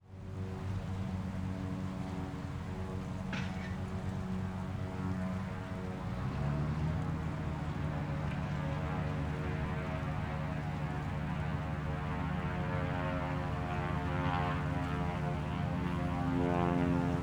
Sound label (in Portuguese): Avioneta de pulverizacao de agrotoxico; maquinas; colecao sonora do cerrado